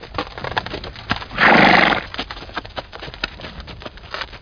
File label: hoof; OUTLAWS